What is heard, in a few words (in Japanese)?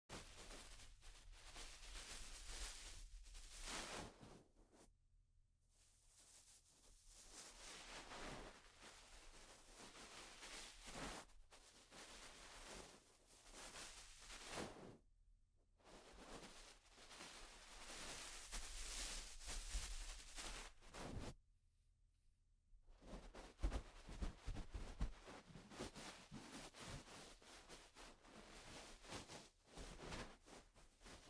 梵天（声無し）